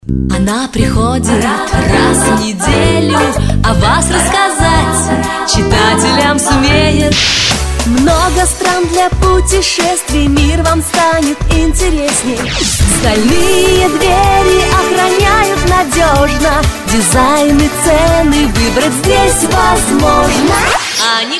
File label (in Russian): vokal